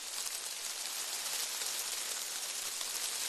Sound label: leaves rustling